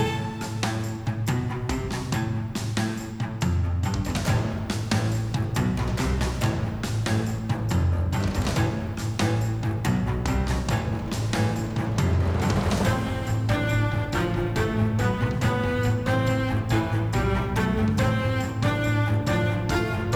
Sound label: Enemy Battle LOOP